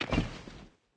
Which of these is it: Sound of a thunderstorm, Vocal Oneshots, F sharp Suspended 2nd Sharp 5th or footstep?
footstep